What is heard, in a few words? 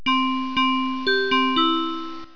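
xylophone; Kids' CD-ROM Bible Fun Pack; Education Publishing Concepts; NOAHDEMO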